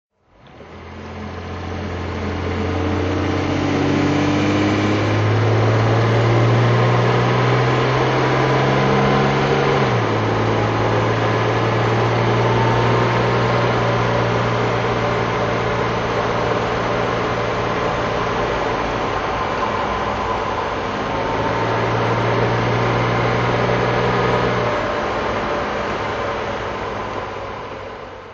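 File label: jaguar mk2 34 auto drive, Engine sound driving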